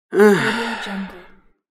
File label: tiredness